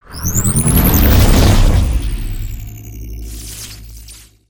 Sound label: Maingate Layer; ambience